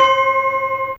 Gam C4, Fairlight CMI IIe, Mallets

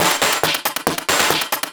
percussion loops, Techno; Best Service ProSamples vol.45 - Techno ID; PS-45

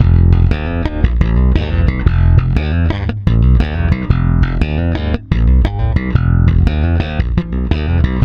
F#, Bass; Spectrasonics; Bass Legends; 128 PWRSTRUM

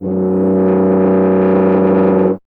BRS TUBA, Solo Instruments

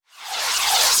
Misc Short FX

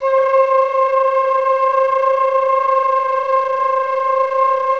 FL FLTG C4, Advanced Orchestra; Best Service

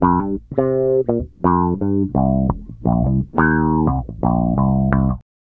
FUNKY 86 C, Bass Grooves; USB Soundscan vol.30; AKAI

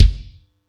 Yamaha EX-5

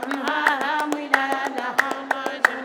MWAH WMN, CLAPCHOIR; Spectrasonics; Heart of Africa 2

